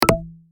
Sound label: pop stop; Handy